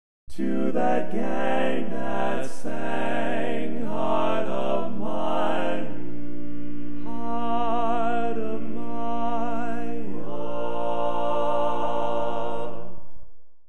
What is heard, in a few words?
Lead, Classic Tags